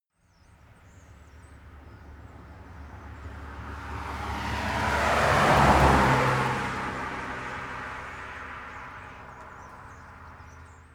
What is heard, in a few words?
car drive by fast